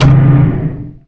Duck Servo

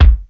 Collision

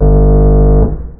Auto Canon Jam

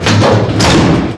Door Open

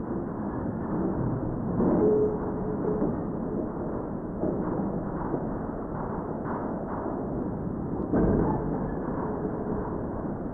Ore Drop

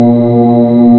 Wellhead Device

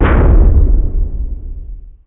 Collision Ext

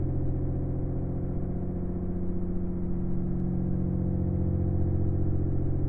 Generator Drone

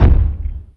Foot Fall Ext

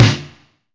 Mech Explosion

